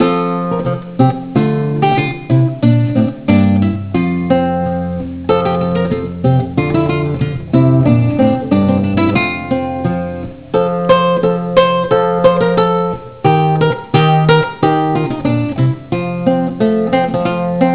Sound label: pub music